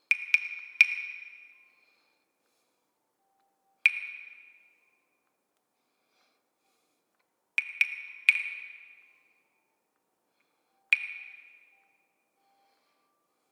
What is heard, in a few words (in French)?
claves; celle qui fait tout mon tourment airs serieux et boire h450; chantons baroque